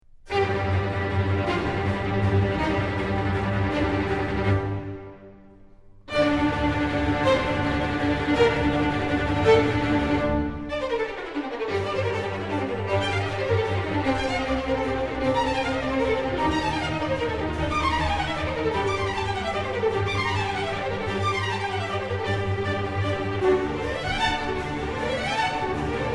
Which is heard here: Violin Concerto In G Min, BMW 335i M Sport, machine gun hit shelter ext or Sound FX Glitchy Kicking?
Violin Concerto In G Min